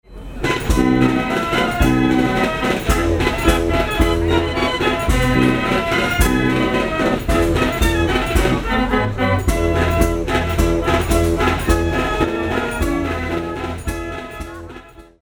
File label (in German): Kirmesorgel, oktoberfest; Oktoberfest 2010